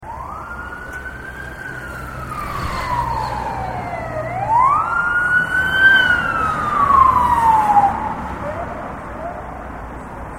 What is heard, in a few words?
Police siren sound car arriving street; sedaye azhire police